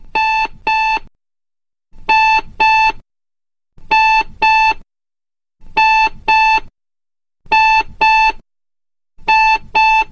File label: New Clock Alert